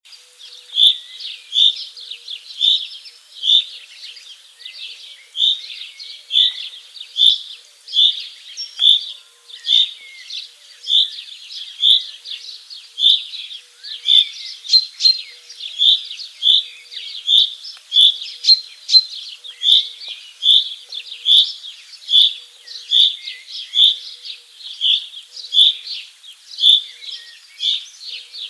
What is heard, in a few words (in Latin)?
Fringilla coelebs; SETE